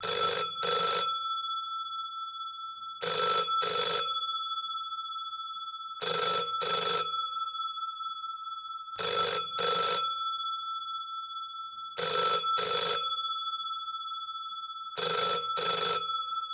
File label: Belgique bell phone